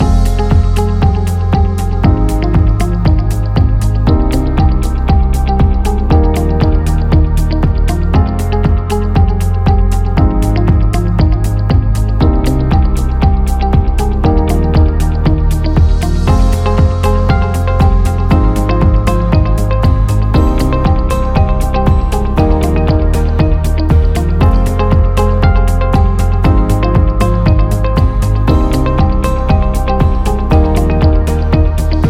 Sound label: Business Loop